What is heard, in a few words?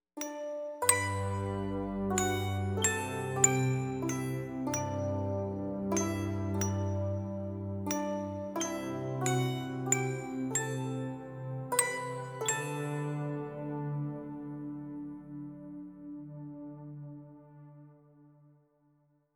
Melody Full